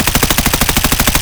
m4a1 fire silenced close loop; Escape from Tarkov

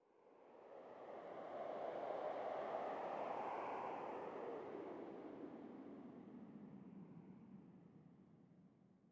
sting wind gust; Rust

